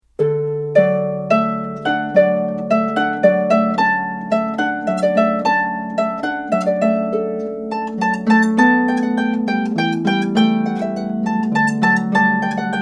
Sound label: Allegro Maestoso, harp; Water Music